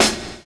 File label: HIP SAX; Univers Sons